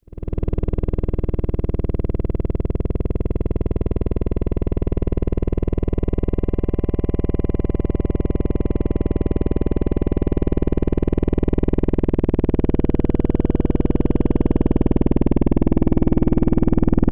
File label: flyover Synthesis at Source 10 Deg Resolution Source Noise